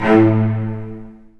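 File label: Cello Spiccato A1; Digital Sound Factory Virtuoso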